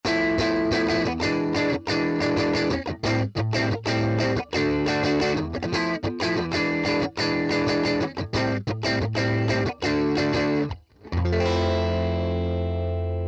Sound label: Bassman